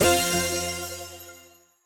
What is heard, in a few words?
small Win; netent; twinhappiness